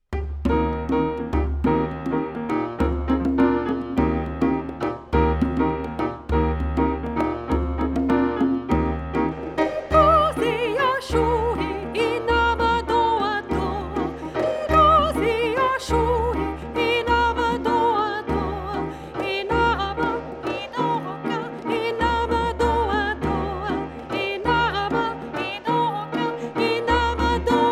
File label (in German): mit Gesang; Ngozi